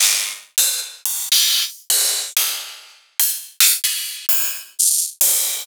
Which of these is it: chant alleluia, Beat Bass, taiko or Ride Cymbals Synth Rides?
Ride Cymbals Synth Rides